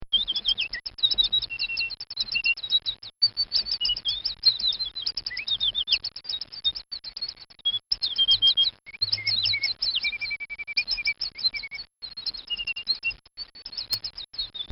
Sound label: Bird Songs; Chirps